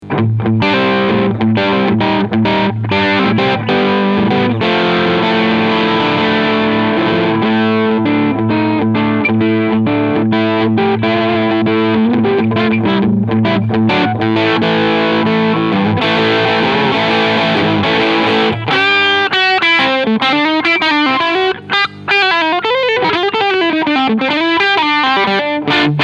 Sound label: Crunch